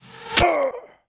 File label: arrow hit; AoM-ARTofMyth